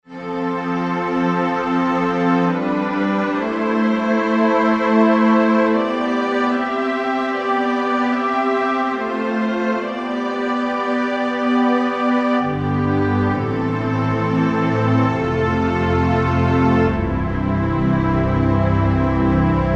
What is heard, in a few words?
Underscore PAD; Worship Flow